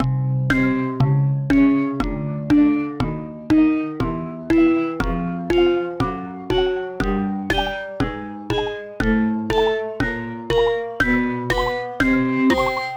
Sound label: timer; 15 second timer